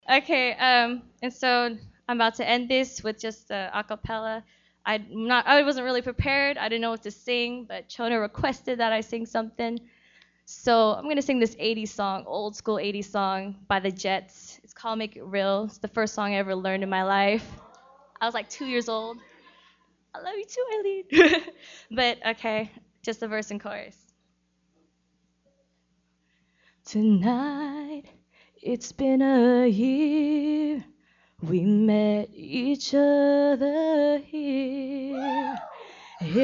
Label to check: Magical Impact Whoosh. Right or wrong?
Wrong